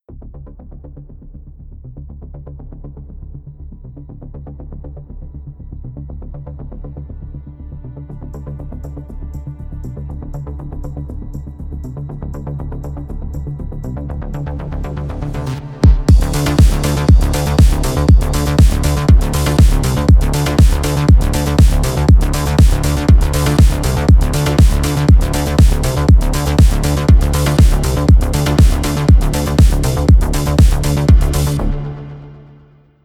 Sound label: cyberpunk